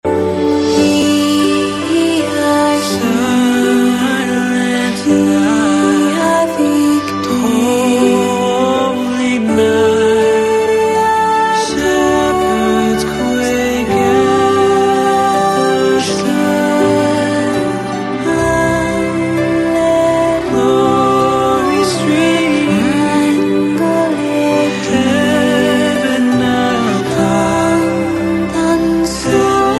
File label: silent night duet